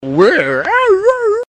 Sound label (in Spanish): grunidos; chicobioca